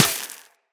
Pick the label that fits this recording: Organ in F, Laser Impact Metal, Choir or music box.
Laser Impact Metal